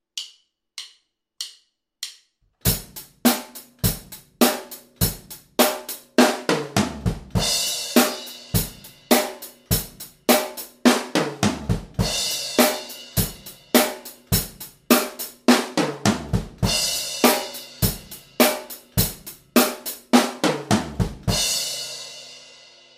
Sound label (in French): break passe partout, batteur